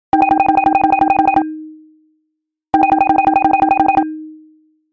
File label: nokia mischief